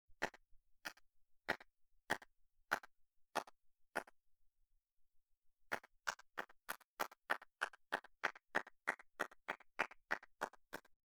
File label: gallery